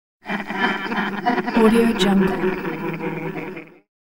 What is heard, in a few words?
sinister laugh